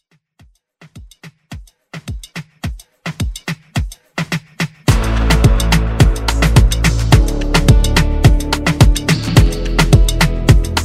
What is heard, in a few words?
Moombah; MoombahRedrum